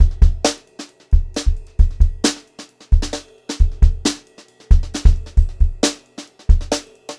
Brushed Drum Loops; USB Soundscan vol.39; AKAI; 133 HOTROD

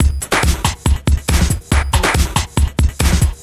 Break Beats, 140 BPM

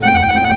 ACC TRILLER; Best Service; World Colours

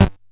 Bent Kick; glitch; Bent Hits

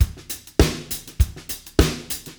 disco 100, Beat Loops; Zero-G; Monster Beats

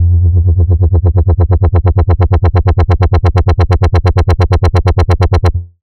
BASS 91 (B); neuro; Stanza

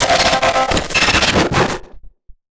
Casio, Glitched, malfunctioning synth

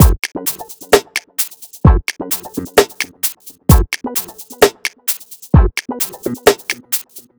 BEAT, Hip-Hop & R&B; USB Soundscan vol.47; AKAI

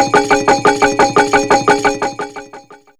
Rhythm, Fairlight CMI IIe, Percussn

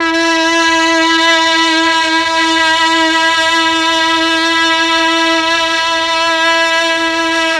MEL STR, Legendary M400; ILIO; Propeller Island

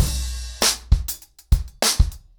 Drumkit 100BPM, ACOUSTIC DRUMS; Sonokinetic - The Roots Drumloops

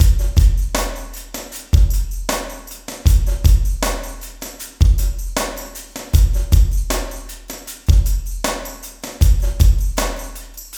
LOOPS

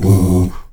AHOO F#2B, Heart of Africa, STAC.OO-V; Spectrasonics

